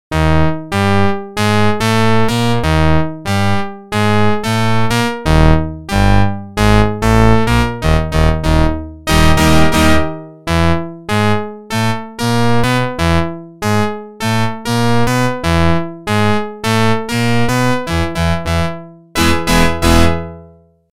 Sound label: Organ; NHL 94